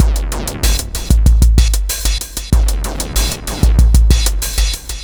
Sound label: electric storm, 95bpm